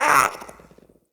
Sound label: Pet Speak Short; Enemies; Catbrow; Pet Ship